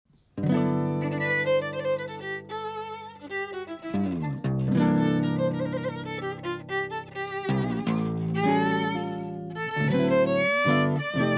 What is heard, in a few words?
duet